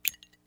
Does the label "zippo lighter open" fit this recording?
Yes